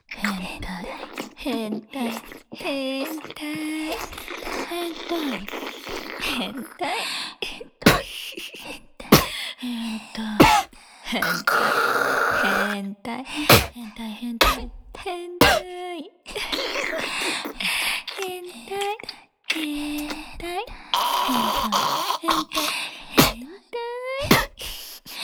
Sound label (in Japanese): 唾吐きループ（変態ノイズのみ）